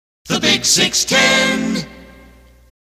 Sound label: Acap Mid, Jingles; KFRC